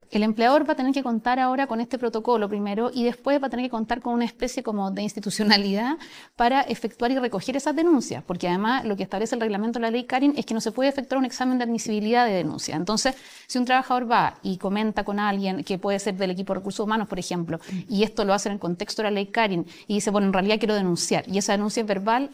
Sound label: vocals